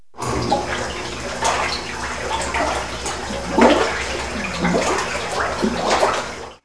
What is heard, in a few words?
milk stir; KABOOM! - Wild and Wacky Sound Software; farmlife